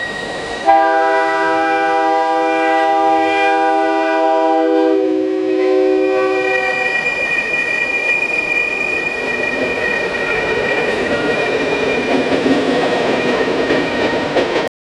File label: train whistle enter in tu, tunnel